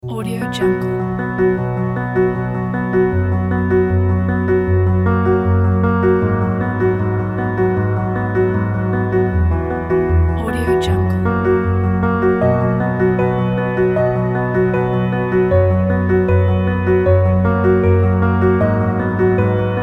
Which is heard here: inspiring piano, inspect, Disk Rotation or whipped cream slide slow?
inspiring piano